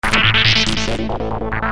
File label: Cutoff Arp